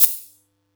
Yamaha EX5, Drums

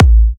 A, kicks; markus hakala kicks

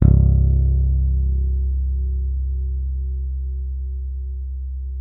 C1, FINGER MUTE; Xsample VOL-1

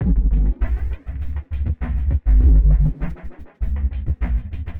Ghost Loop 100BPM, drum loop

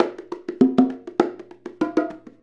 Bongo; Essential Percussion

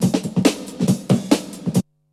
BREAKBEATS, TECHNO

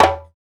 African Perc

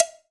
sfx perc

